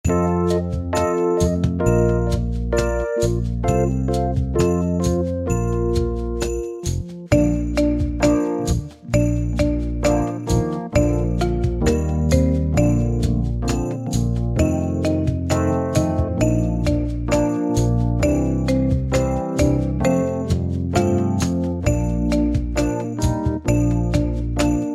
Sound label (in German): Jingle Bells Playback B-Dur